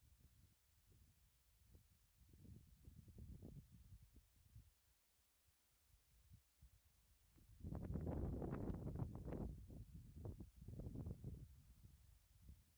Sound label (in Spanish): Cañada de Bagil (Moratalla)